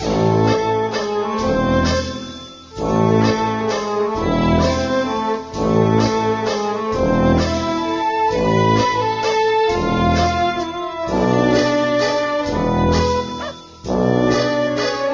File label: Level Theme